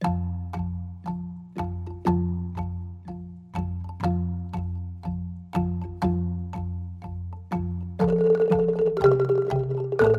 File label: The Waterfall Vocal